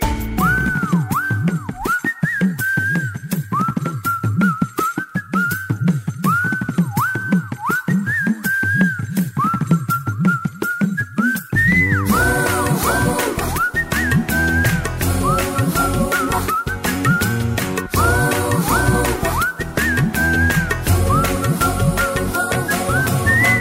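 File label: Whistle Humming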